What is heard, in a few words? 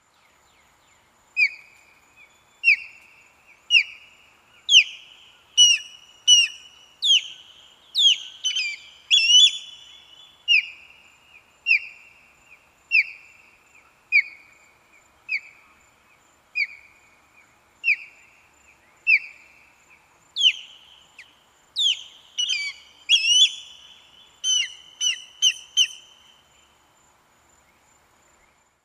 Western Osprey Pandion haliaetus